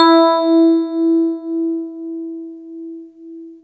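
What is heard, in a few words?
digital synth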